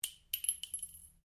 Cartridge 22 Caliber Long Impact Ceramic Tiles Stereo; shell casings of different calibers impacting on different surfaces